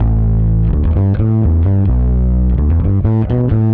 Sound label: Bass, 128bpm, A1, analogue circuit; musicradar